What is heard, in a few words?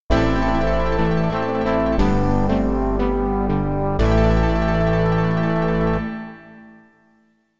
Jingle, MT-32